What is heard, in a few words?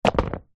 foley clothes football soccer boots drop on concrete ground